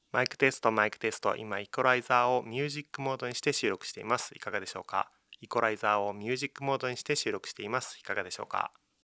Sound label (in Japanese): EQ音楽